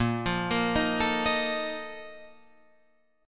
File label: A sharp Major 7th Suspended 4th, Guitar Drop D